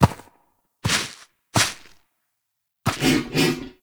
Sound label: Ram Idle Scratch Ground; Warframe; Duviri